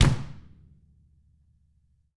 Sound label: DW FELT, DW KICK